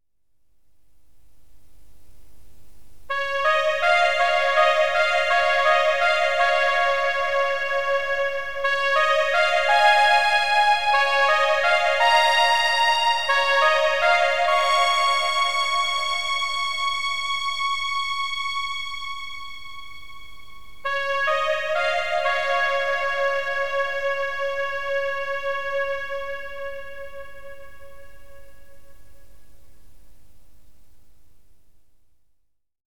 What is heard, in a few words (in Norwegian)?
FREDS FANFARE